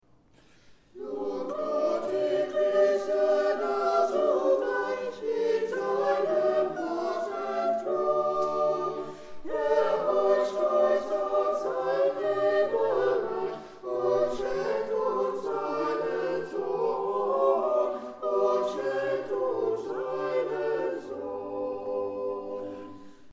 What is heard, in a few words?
chorale; Lobt Gott Ihr Christen allzugleich; Orgelbuchlein